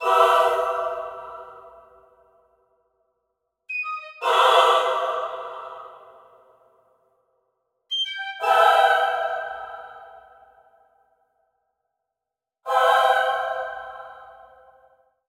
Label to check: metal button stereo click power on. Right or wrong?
Wrong